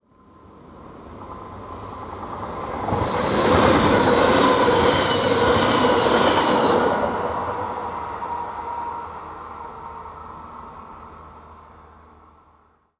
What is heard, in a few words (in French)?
trains diesel et electriques; sonotheque